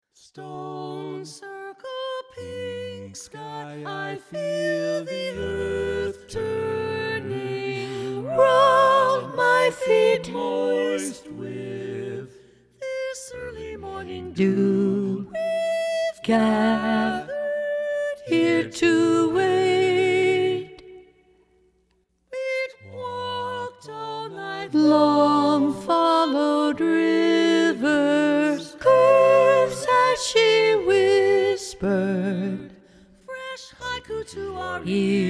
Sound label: Alto focus, choir; Summer Solstice 2